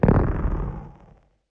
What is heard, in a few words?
Darkboom; GONNER